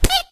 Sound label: hurt, pollet